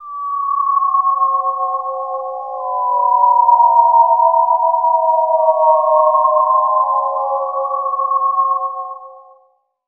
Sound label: odd, Weird Loops, Chillout; Best Service ProSamples vol.36 - Chillout